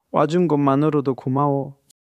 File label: crying